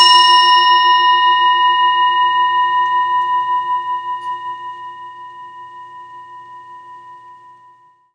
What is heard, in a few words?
bowl